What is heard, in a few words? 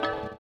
orient, bizarre guitar; Spectrasonics